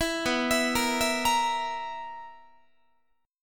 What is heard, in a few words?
B Major 7th Suspended 4th B, Guitar; arpeggiated; 19,19,19,20,20,21